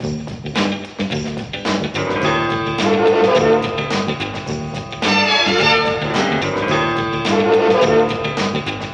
horns and piano, loops; Phonky

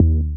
kick, Heartbeats

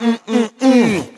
mmm mmm mmm; ooh ahh

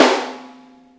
Snares Real

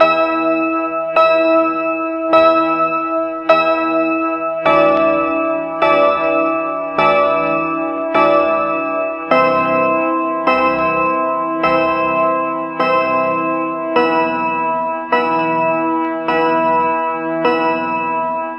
keys, Sad; loops

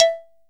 schoolhouse, Bells

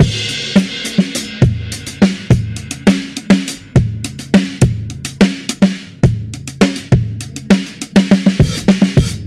hard hitting, funky drum loops

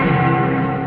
saloon, Piano

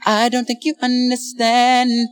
i don't think you understand SUNG

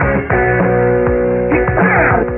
guitar classical, Loops, Phonky

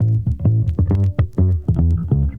bass, Loops, Phonky

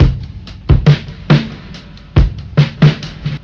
drunk drummer, Drum loops Funky

